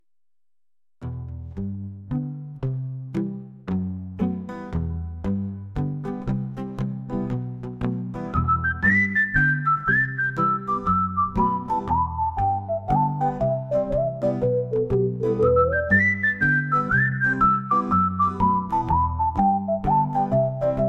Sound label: Interlude